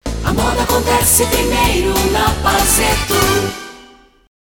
Vinhetas; Paseto

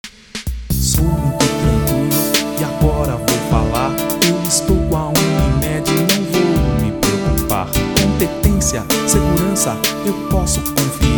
Rap; Unimed

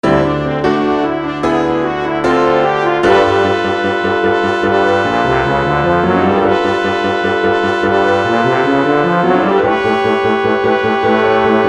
Quinteto de metais; Big Five

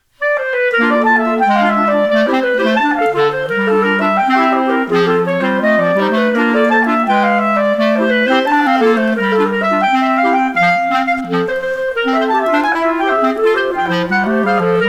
para Clarinetas; Cachorinho

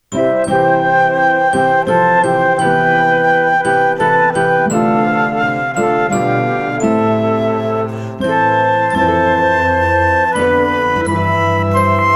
Arpejos de Natal

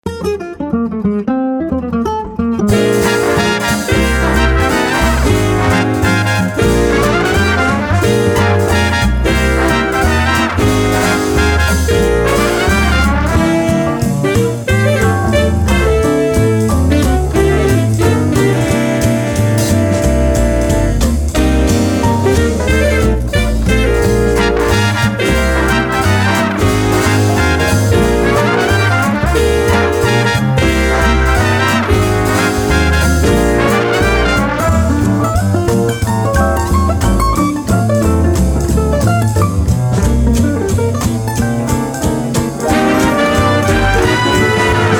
Metais Sax; Espelho

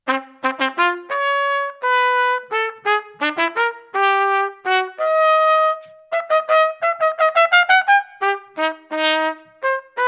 trompete solo; Duas pecas para trompete solo; Nefritaloe de Glauber Santiago

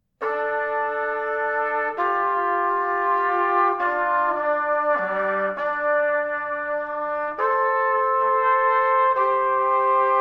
Pentatonica Quiuchua 2o modo B menor Duetos Trp

